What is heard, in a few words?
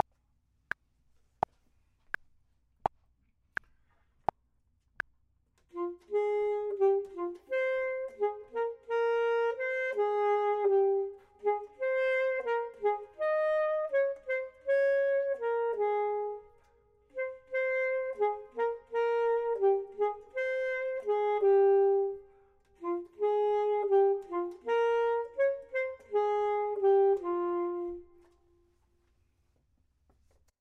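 top, Saxophone and Clarinet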